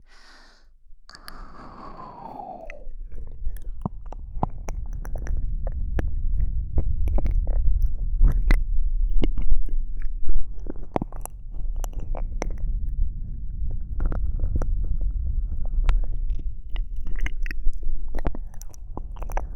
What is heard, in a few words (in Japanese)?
頭に舌をいれちゃうコース